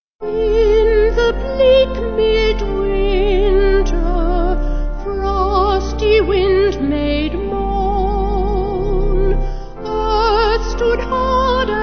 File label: Vocal Organ